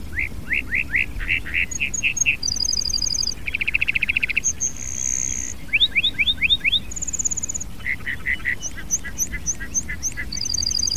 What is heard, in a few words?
Lark Bunting; Cheyenne Cty